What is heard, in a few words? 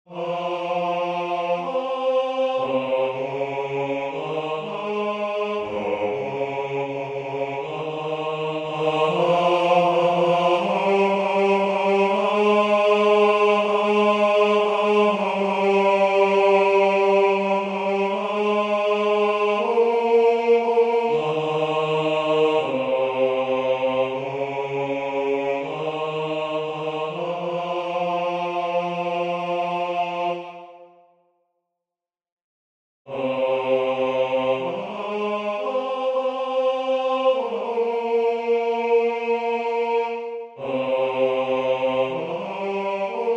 blow blow thou winter wind bas